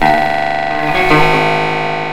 RING guitar; GUITARS KIT

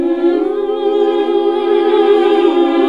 SING, CLASSIC VOX; Best Service; Hallelujah

